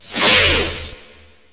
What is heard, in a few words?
ZAP; RED VOLTAGE